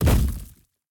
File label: footsteps; smite; atlas